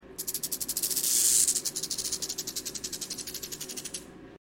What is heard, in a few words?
Jirjirak